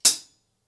HiHat; The Roots Drumloops

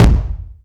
Mass Kick, dry kicks; Quasimidi Rave-o-lution 309

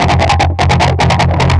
Overdrive, Industrial, Nasty

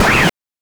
BANK2 SFX, NES DPCM; MIDINES

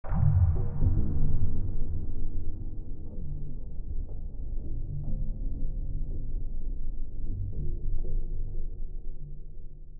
Suspence Bubbles, cinematic soundbeds; musicradar

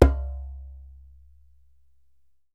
DJEMBE, African Perc

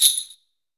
SUZU; AKAI S-Series CD-ROM Sound Library VOL-5

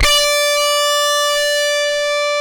rock D4, rock guitar; InVision Interactive; Lightware VOL-1; Instruments & Percussions

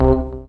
DM ORG1, Dance Mega Synth

